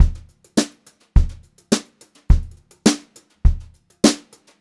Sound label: BEAT, Brushed Drum Loops